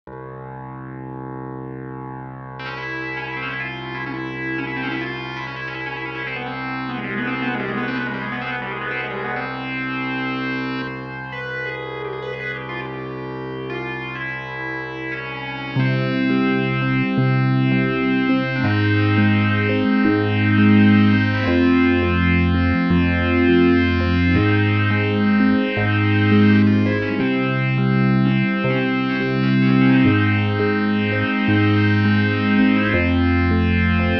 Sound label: harmonium; b4; tonewheel